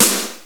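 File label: snare drum rock; Software Explosion 2 - Virtual Computer Pets; yamaha xg; drums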